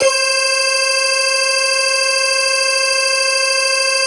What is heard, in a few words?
synth clav